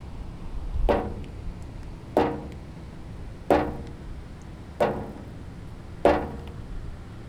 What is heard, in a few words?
quiet bouncy ball; fieldrecordings